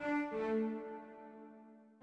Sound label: orbit cello; Sky Children of the Light gamerip